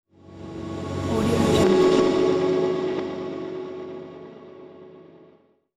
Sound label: transition epic; transitions and movement